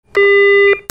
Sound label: ALARMS